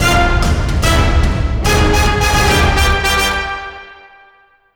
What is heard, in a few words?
Music Stinger; Ms. Splosion Man